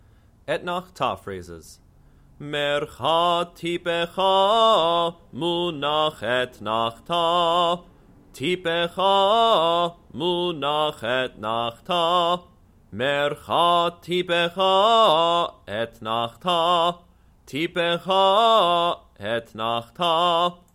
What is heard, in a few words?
Torah Trope Etnachta Phrases; Kol Ami — The Northern Virginia Reconstructionist Community